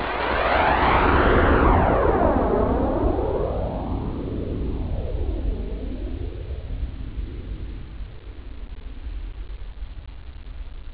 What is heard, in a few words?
phase